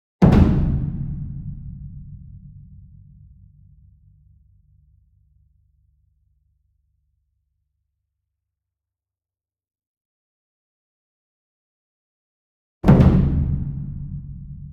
ENSEMBLE DRUM Bass Drum Concert And Taiko Flam Medium; boom library; Cinematic Strikes Construction Kit